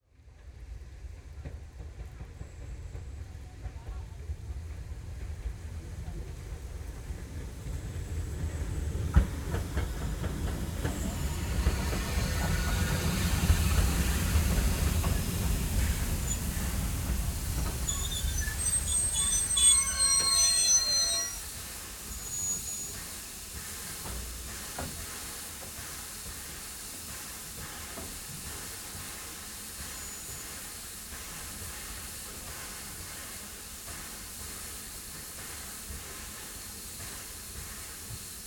Steam Train Arrival, Rail Clatter, Brakes Squeal, Idle, Mic on Locomotive; Tone Glow Libraries; Steam Trains